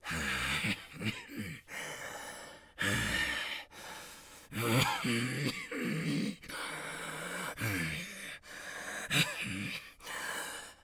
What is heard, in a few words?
breath hurt; Escape from Tarkov